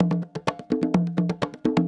Percussion Loops, Bongo & Conga; Houseworx

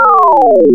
Phone Shift, wavetables; Animoog

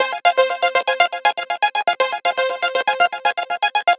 Progressive Synth, Trance

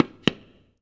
TITE, TABLAS, PERCUSSION; AKAI S6000 CD-ROM - Volume 1

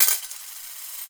Coins, household rhythm samples Hits

